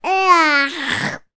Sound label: human boy 3 years old british says ugghh disgust